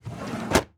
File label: Drawer Open; Haunted Bloodlines; SFX Producer